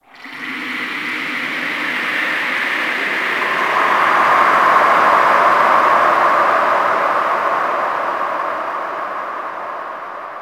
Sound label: Ocean Underwater Texture Medium; Warframe; Grineer Ocean